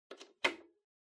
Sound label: Portable Stereo Earphones Plug In